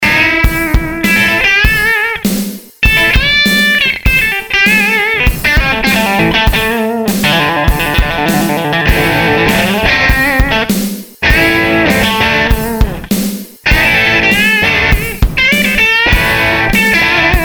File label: marshall plexi; m-audio; blackbox